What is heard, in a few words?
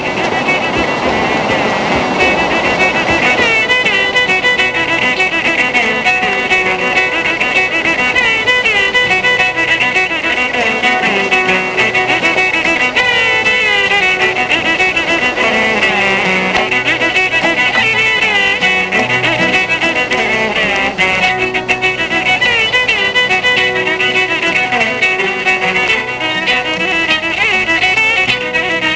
Violin; Interview With the Vampire